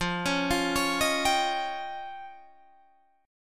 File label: F 7th Suspended 2nd Sharp 5th, Guitar, Drop D